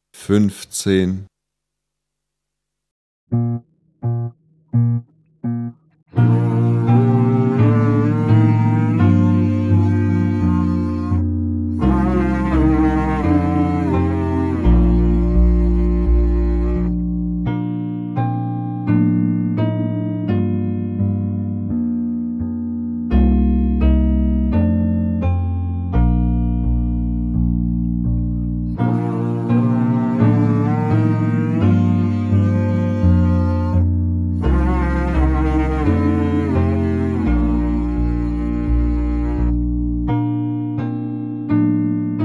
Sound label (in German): Klang, Hornschule